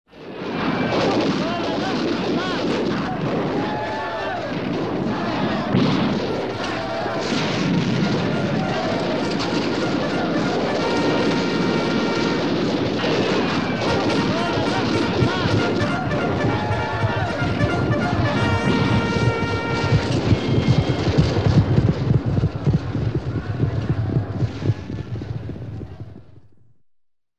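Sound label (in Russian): сражение с индейцами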